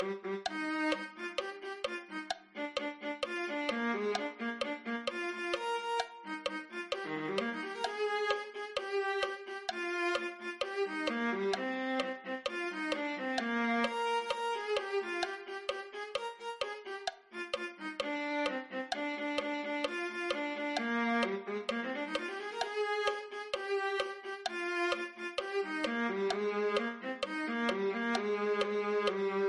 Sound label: viola